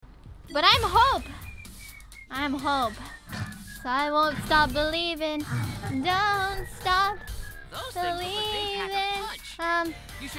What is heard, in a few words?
Singing; IRySinging